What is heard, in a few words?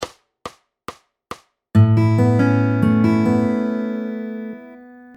Fingerstyle Movable Chords Add9